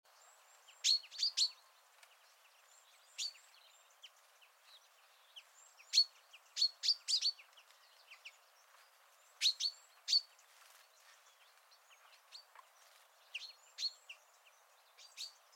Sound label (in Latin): Fringilla coelebs; SETE